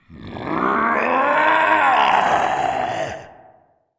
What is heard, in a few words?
orc cheer